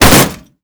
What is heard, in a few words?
television fall; Call of Duty Modern Warfare; physics